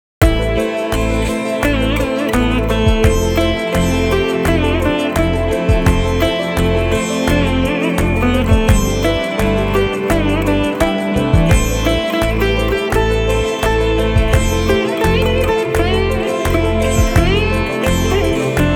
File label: Ringtone